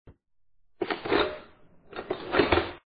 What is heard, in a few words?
reload; vapesquared